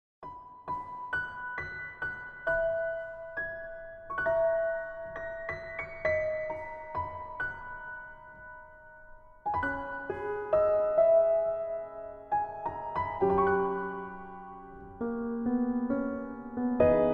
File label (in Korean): Piano ver 멜로디MR 여자키